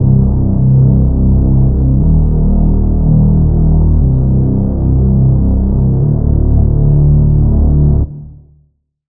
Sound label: Reese